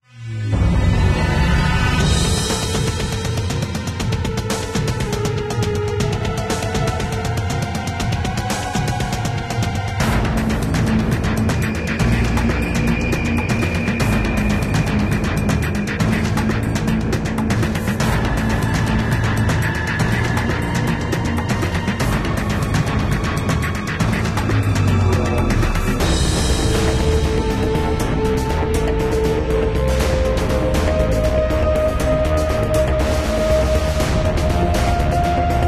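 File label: Battle Music; Android; mobile; Windows; Xbox One; Ailment Batte Music 1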